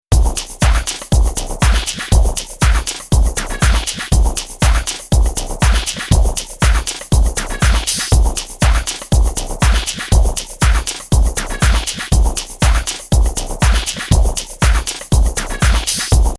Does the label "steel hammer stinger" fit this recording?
No